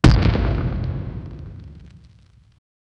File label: single crisp demolition